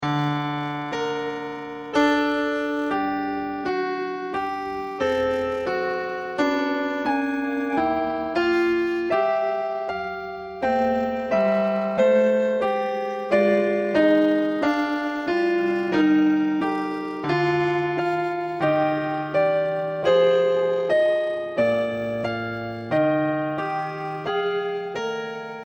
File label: piano